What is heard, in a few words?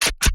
Stylized Gun Reload; soundscrate